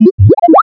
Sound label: robot hit; Earth and Beyond; EA Games